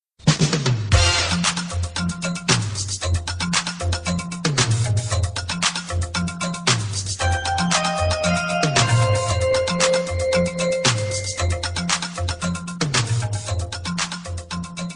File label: yamaha dx7